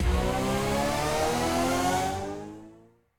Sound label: respin Snd, slots; king of slots